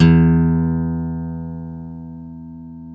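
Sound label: E1 acoustic guitar, 6-STRING; InVision Interactive; Lightware VOL-1; Instruments & Percussions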